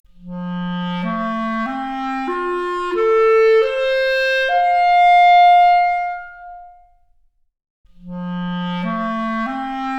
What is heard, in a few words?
Clarinet arp, Stereo output; 4bit